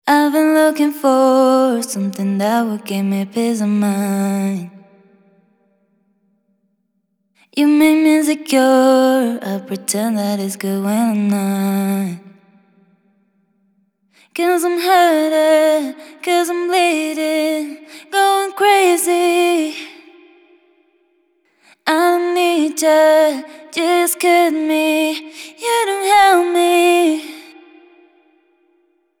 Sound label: Master Room XL-515 - Female Vocal (Long Hall); Nevo Studio Springs